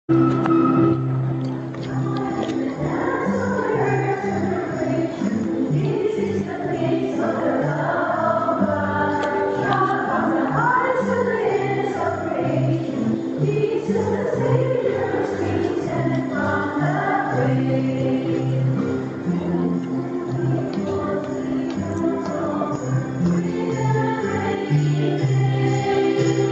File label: entrance song